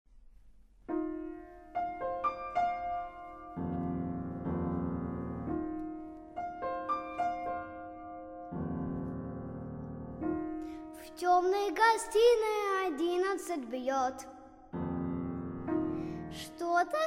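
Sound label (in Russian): вокальный цикл для детского голоса сопрано альта виолончели и фортепиано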